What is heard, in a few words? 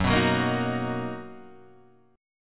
B Major 7th F sharp, chord